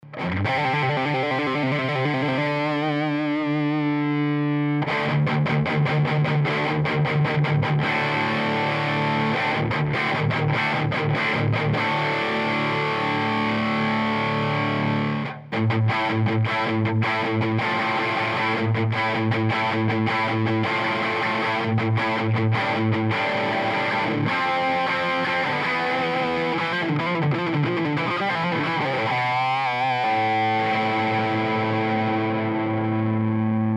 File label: Hyper Vintage Bridge Distortion